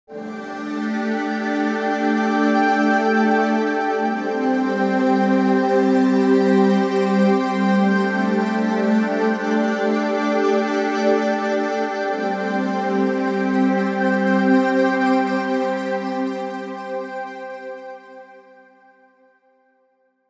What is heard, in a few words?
Rapid Bell Pad; Parawave